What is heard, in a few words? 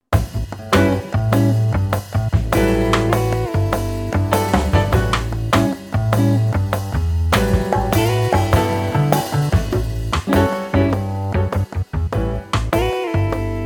Jazz Blues In A 100 bpm